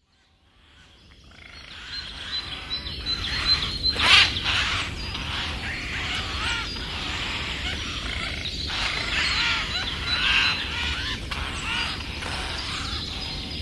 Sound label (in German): Eichelhaeher